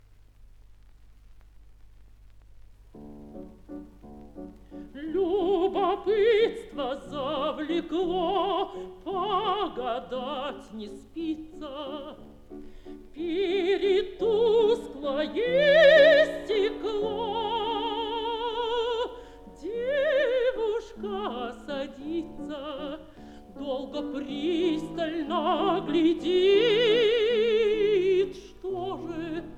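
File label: mezzo-soprano accompanied by piano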